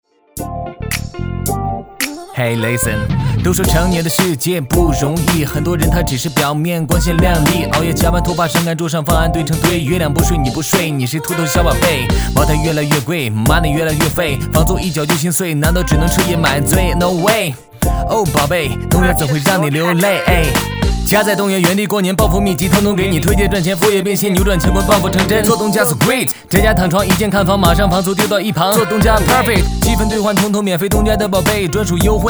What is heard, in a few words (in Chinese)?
说唱